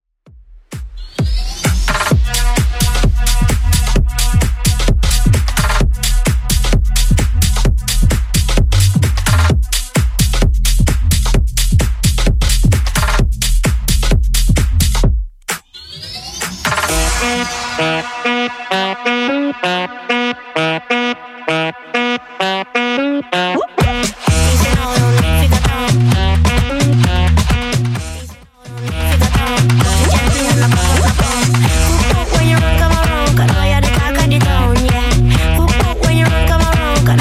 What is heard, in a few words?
Bootleg House 130bpm